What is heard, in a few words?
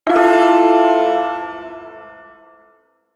Ersatz Shawzin Maj Chord; Duviri